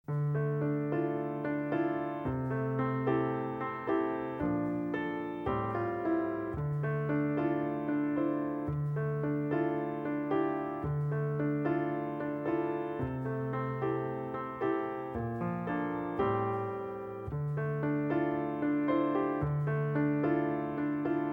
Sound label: accomp